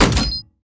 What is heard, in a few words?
breach land; Counter Strike Model Pack; CS:GO; survival